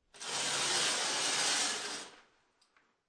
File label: Shower Curtain Open, Household, Shower Curtain Open, Metal Shower Curtain Holders Sliding On Metal Shower Pole; Bathroom